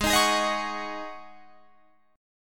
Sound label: Ab 7th Suspended 4th Ab down Guitar Open F, Chord; Ab7sus4 strummed